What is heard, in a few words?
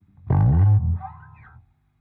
bass slide up fast; manabu murai works